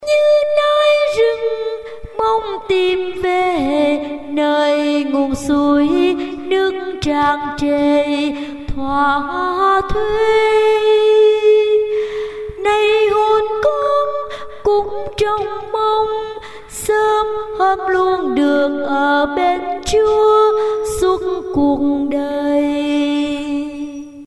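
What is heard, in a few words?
soprano tenor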